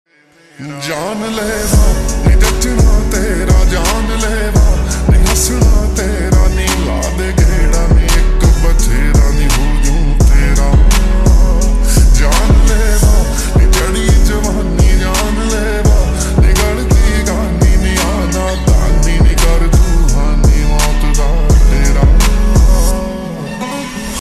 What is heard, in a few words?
Slowed Reverb Ringtone